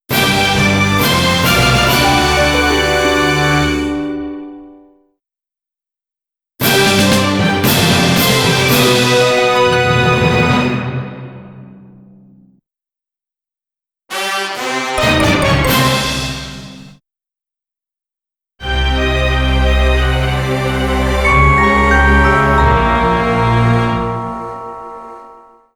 haunted jingles; Haunted Museum II: Youkoso Genei Yuuenchi e

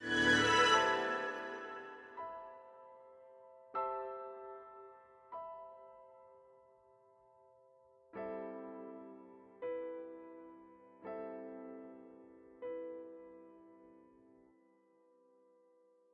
full horns; Sky Children of the Light gamerip

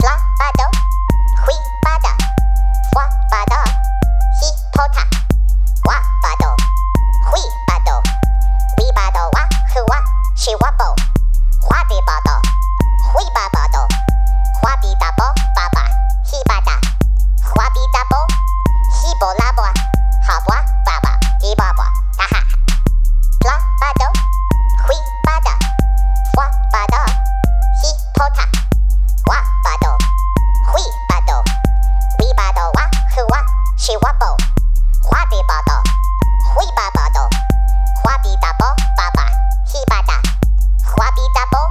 Rap Dance; super animal royale

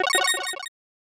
Item Pickup

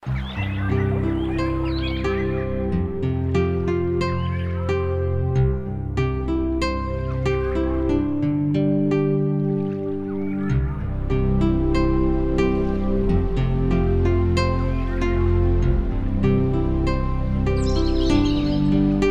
Looping; Mountainside Looping SoundImage

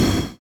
Flame stop; gamerip; I Am Weapon: Revival

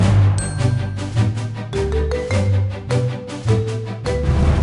Stinger Win Looping; Candy Crush Soda

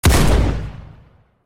Ithaca Fire; Criminality

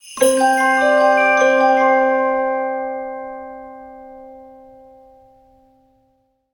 stinger loot box winterveil rare; heroes of the storm full gamerip 2015

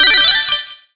CHECK POINT

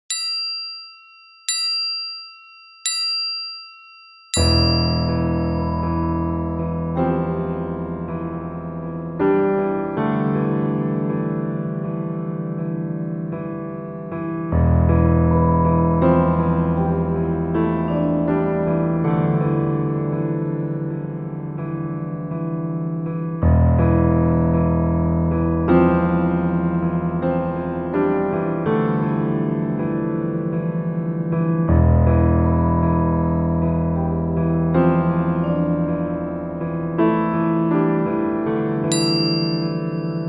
Piano of Darkness; rusty lake roots b side

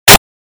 Cracking; Dig Dug II; arcade

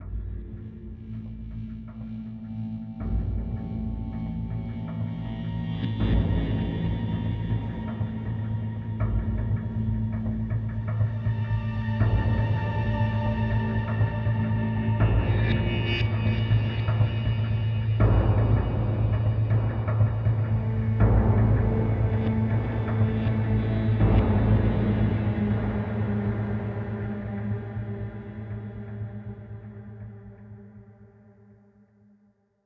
Danger No Melody; the long dark gamerip

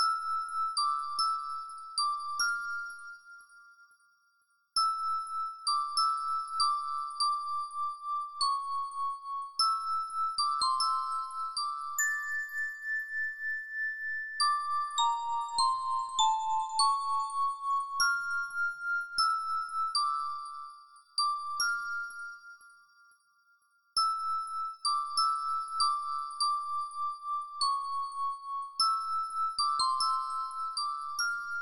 bell; Toki Tori Collection

